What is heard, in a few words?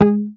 HAMER MUTES, Bass